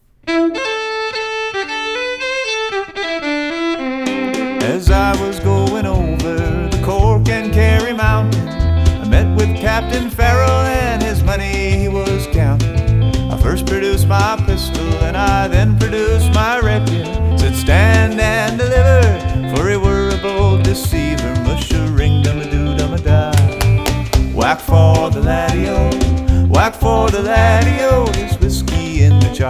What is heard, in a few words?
Irish Celtic